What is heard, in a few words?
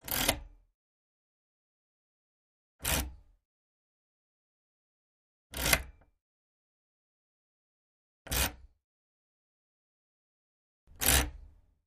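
Keys Latchkey Wood Door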